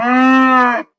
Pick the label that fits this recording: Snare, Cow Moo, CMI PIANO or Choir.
Cow Moo